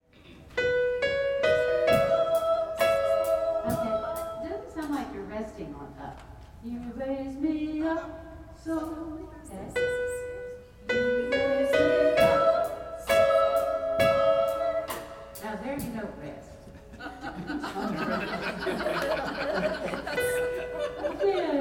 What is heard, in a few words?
soprano